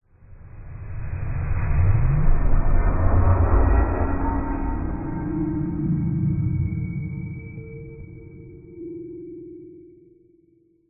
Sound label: sound design swell weird futuristic dark dull; zapsplat